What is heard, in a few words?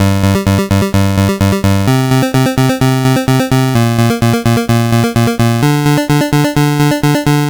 Game Boy Bass; Nintendo Game Boy